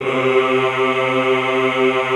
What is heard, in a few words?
EHH C2, Classical Choir; Best Service